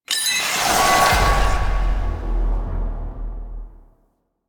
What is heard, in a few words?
Confirm Socket Shard Mythic; DE; Warframe; Helminth Shards